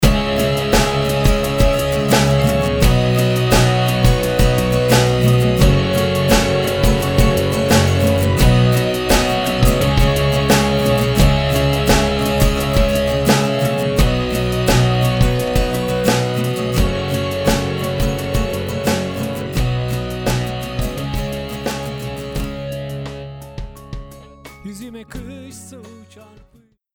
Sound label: slowrock